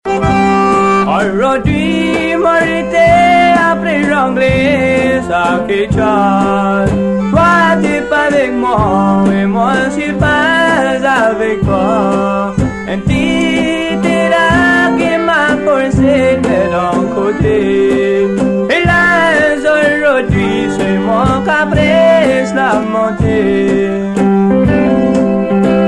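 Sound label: Waltz, Cajun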